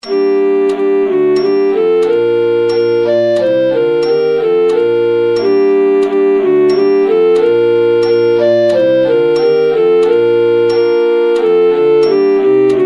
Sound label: Belle Qui Sop; Arbeau